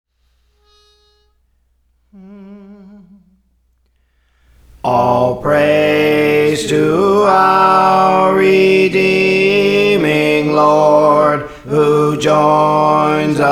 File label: Alto; Praise For The Lord Training